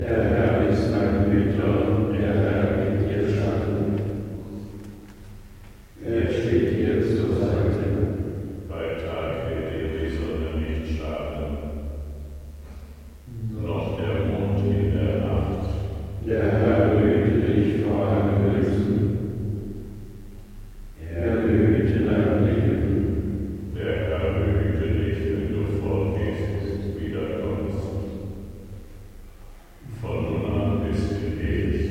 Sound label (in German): Atmo Vespergebet